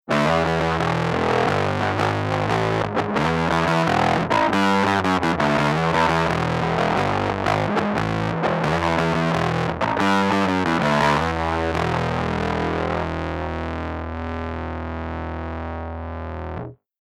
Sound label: BIG MUFF